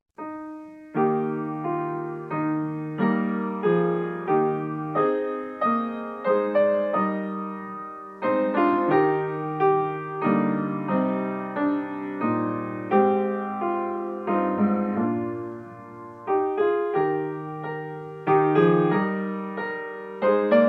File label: ANTHEM Wales; europe